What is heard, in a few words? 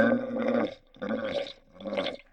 mobs lavaflan; server-nalc; mobs-master-TenPlus1